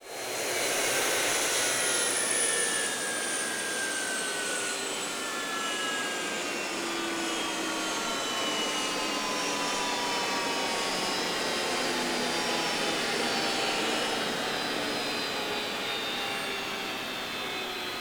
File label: F-86 J47 End; DCS